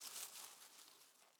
leaf rustle crunchy soft

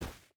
footstep hide snow run; Rust

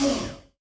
P-51D Cockpit Actuator Oil End; DCS

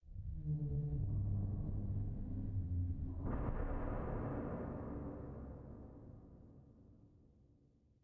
sting metal groan; Rust

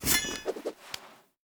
machete deploy; Rust

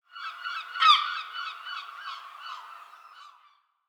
ambient sea birds

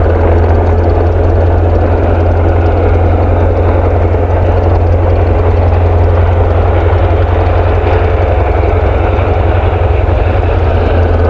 Vehicle Engines; Red Orchestra 41-45

